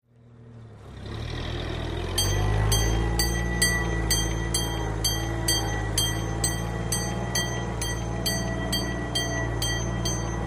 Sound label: Fire Truck Interior